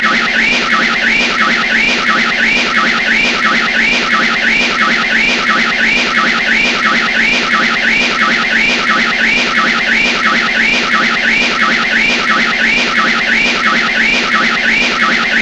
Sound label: super spin; dr beansons